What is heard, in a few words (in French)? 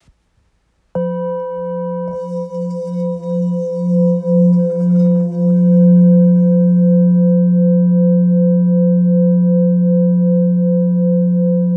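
Bol ancien fa, bols chantants tibétains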